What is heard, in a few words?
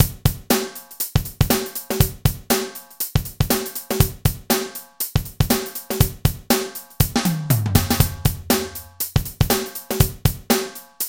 acoustic Set, Drum Loops